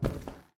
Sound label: tile